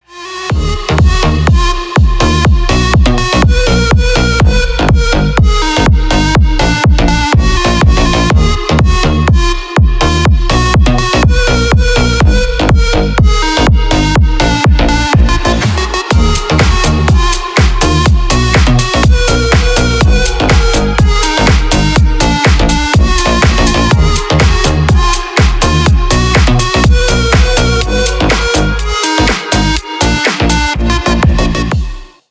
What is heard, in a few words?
techno